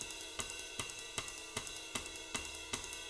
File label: 310 BEBOP, Living Drums; Sampleheads; Peter Erskine